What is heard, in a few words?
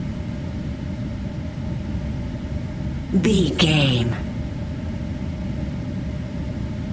background air vent fan loop